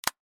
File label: Cell Phone Hardware Close